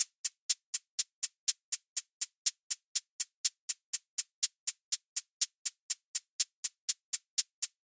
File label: 122 BPM Hat Hi Hat; mmb drum arsenal vol 1; Hi Hat Master